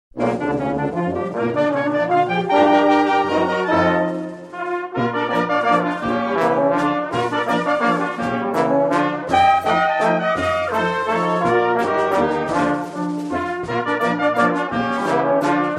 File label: waltz, fanfare